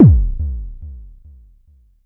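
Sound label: Mod bass drum, Jomox Airbase99, Drum Machines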